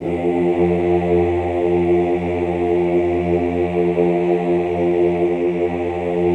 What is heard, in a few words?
OOH F1, Classical Choir; Best Service; Extended Classical Choir